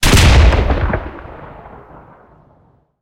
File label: cannon 37mm shot, cannons tanks